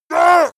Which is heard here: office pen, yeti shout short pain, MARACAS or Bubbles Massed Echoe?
yeti shout short pain